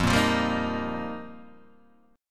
F 7th Suspended 2nd Suspended 4th F Guitar Open D

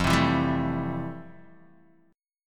F Major 9th F, Guitar, Open D

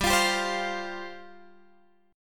G 7th Suspended 4th G down Guitar Open G

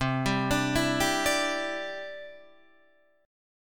C Major Add 9th, Guitar Open D

